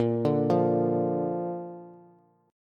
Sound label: Bb Major Bb down Bass; arpeggiated; JGuitar